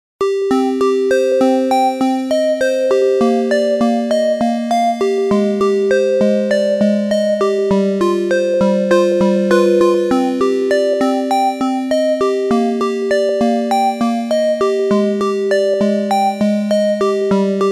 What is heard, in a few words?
analog tones; opxpro